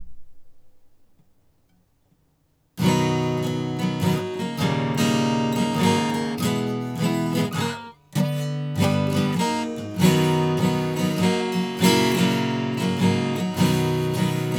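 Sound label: strum left, hsguitar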